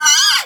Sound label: bug; bug catcher